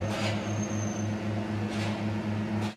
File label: Hoist Boat